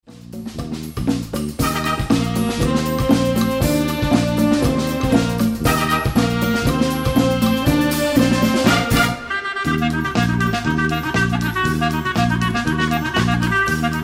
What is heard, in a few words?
Clarinet On Samba